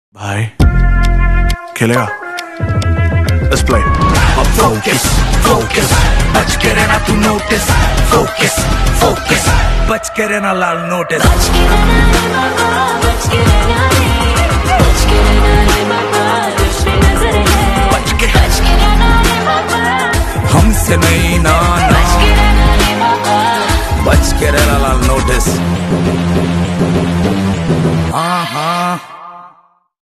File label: rap ringtone